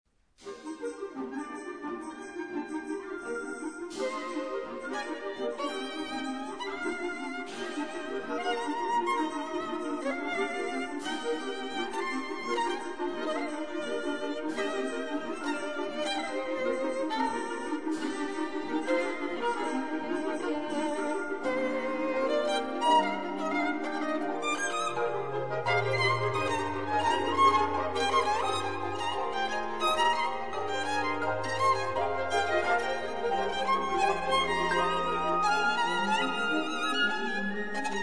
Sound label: Chinese concerto